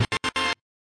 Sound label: 117 two hit synthy blast; SYNTH AND ELECTRONIC LOOPS